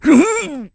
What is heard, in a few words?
Sumo Grab; Sumo match: Bo grabbing Link